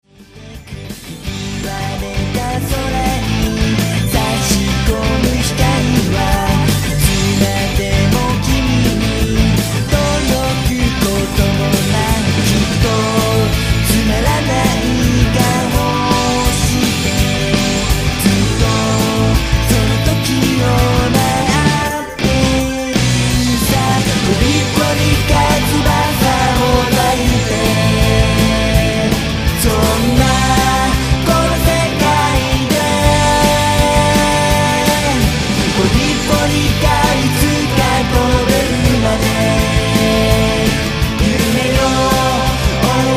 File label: polyphonic girl s